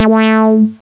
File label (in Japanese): poyon